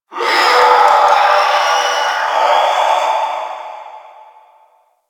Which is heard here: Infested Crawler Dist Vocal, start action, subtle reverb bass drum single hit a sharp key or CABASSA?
Infested Crawler Dist Vocal